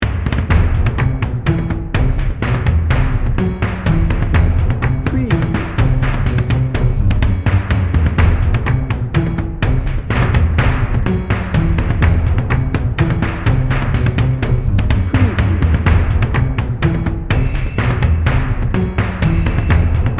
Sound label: heroic drama action dnb